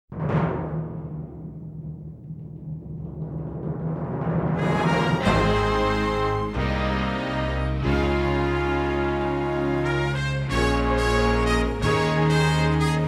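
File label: Fanfare - Instrumental; Network Production Music 092 Above and Beyond (Multimedia)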